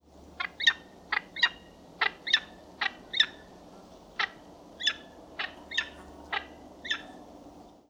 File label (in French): faucon pelerin